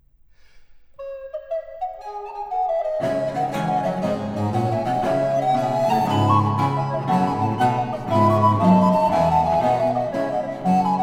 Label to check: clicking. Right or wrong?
Wrong